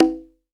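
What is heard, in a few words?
conga high open, PERCUSSION; AKAI S6000 CD-ROM - Volume 5; GM PERCUSSION KIT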